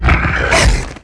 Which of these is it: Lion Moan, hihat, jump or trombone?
jump